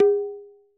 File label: perc, Roland MC-909